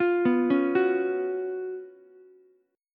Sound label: Gb Major 7th Suspended 4th Gb Ukulele; GbM7sus4; arpeggiated; JGuitar